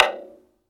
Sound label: taiko normal hitclap; Crimson Stella